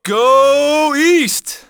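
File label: "East Stars", fan; NBA Inside Drive 2004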